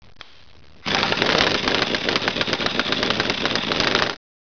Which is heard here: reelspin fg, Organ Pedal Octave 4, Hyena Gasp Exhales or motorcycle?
motorcycle